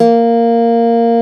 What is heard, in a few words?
N Guitar D2